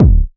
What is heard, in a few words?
rich lows bass drum single shot d sharp key; kick